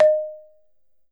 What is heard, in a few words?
Balafon; E-MU Producer Series Vol. 7 – Old World Instruments